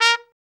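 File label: BRA TRUMP, trumpet, brass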